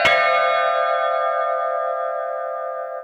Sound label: Bell Chime OS; Sonic Foundry; Rondo Brothers Trip-Hop Loops & Samples; El Corazon